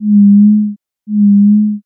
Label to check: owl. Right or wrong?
Right